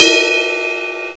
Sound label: CYMBAL; CMI Sound Library Rev 1.3; CYMBALS